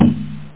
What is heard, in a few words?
bongo